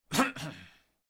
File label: Brocade Curtain; Blind Quest - The Frost Demon